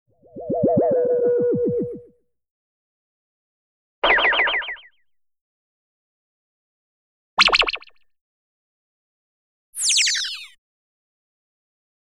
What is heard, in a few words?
Sci Fi Transform Sci Fi Pop On Sci Fi Zap Zap; Underground Caves